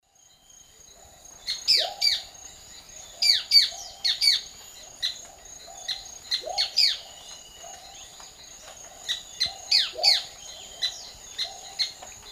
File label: Myiozetetes granadensis, song; S. America; Eastern Lowlands